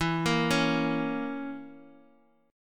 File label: Bb Diminished E